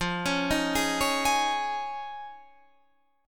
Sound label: Eb 7th Suspended 2nd Suspended 4th